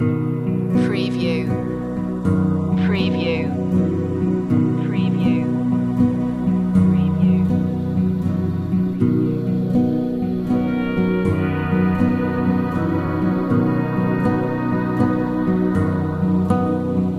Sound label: drifting away